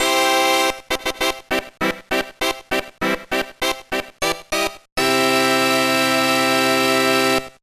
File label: Unknown Jingle; crazy chase; gba